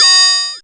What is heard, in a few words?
f#, Rave FX, Dance Synths